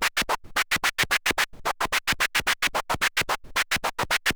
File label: MiniBrute 110BPM, Gated Seq Noise